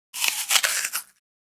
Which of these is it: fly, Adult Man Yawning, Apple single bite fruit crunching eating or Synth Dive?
Apple single bite fruit crunching eating